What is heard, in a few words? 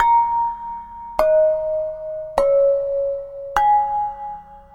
Ceramic Bells